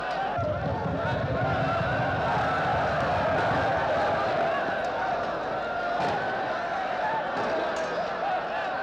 Angry Riot In Street

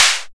Cool clap

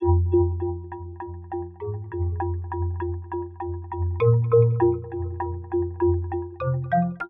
Dreamy Fragment

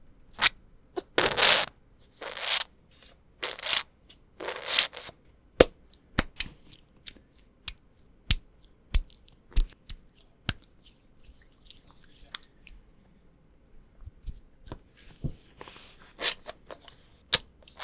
Squishing Gel Between Hands

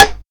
Wood

